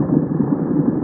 Underwater; Playable Tutorial Level

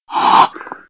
Breathe Out; Playable Tutorial Level

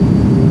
Jet Roofs; Playable Tutorial Level; TR3

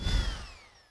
Vehicle Upv Stop; Playable Tutorial Level; TR3

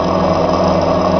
Warrior Hover; Playable Tutorial Level; TR2

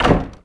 Office Door Close; Playable Tutorial Level

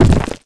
Boulder Fall; Playable Tutorial Level

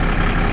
Vehicle Jeep Moving; Playable Tutorial Level

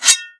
Sword; TR3; Shiva

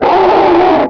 Bird Monster Scream; Playable Tutorial Level; TR2

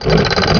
Sword Statue Lift; Playable Tutorial Level; TR2

